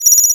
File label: Spaceship